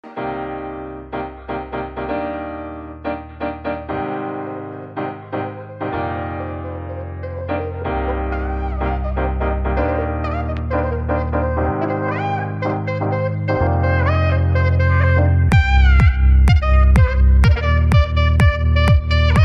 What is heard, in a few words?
shreksophone ringtone